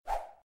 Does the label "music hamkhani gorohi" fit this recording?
No